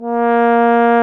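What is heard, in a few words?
brass baritone; Roland - LA Composer vol. 1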